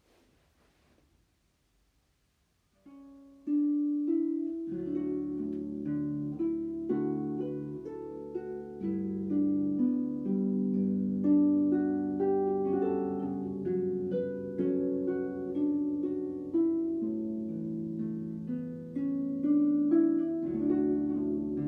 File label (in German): Instr